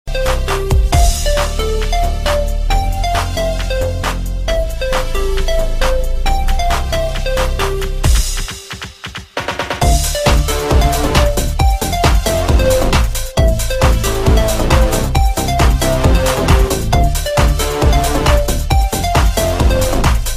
Beat Machine

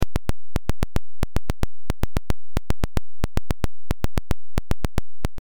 Subtle Vibrations

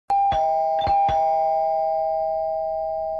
Classical Ringtones

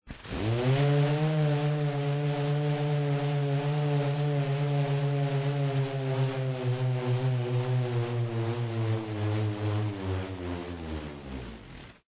LoFi Motor Whir Down

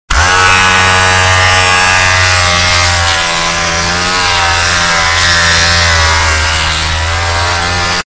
Electric Razor

